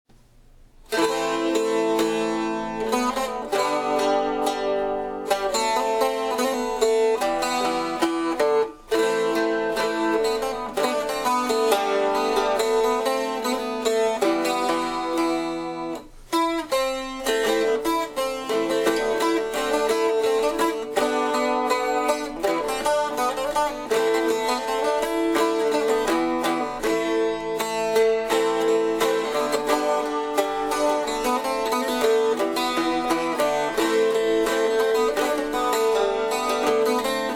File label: tenor cittern example